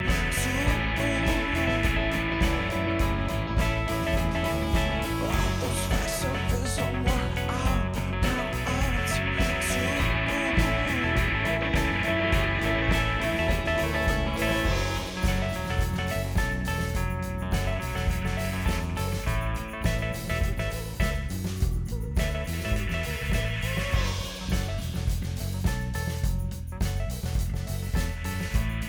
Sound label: Stem Drums